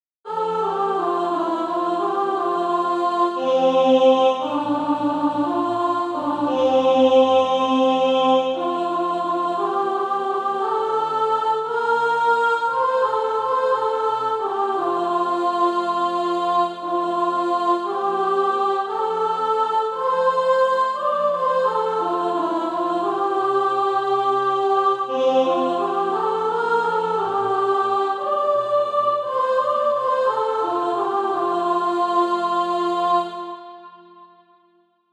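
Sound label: soprano, SATB Practise Music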